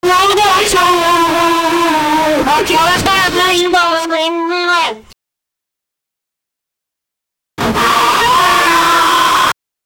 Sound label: crowded indoor pool panic